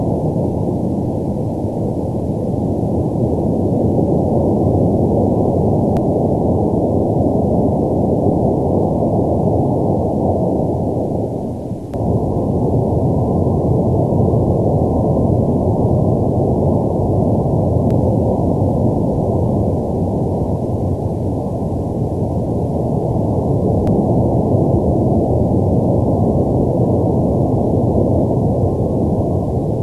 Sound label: WFR audio emfisis